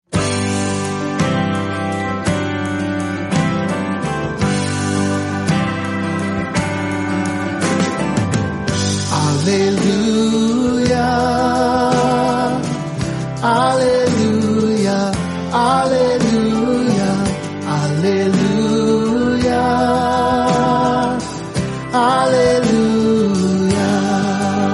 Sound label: Gospel Alleluia